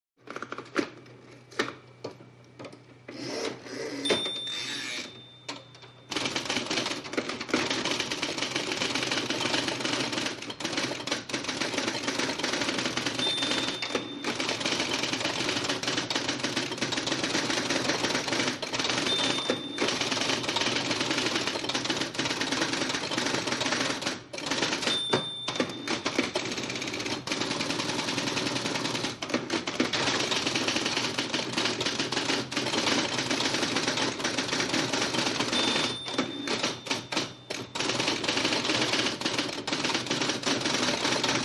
Modern Electric Typewriter; typewriters electric

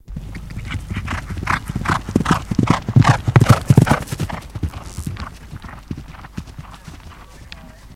Horse Gallop Fst On Me

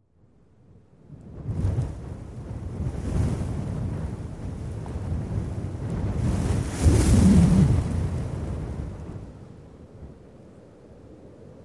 Gusts Very Heavy Wind, sandy desert & gusts

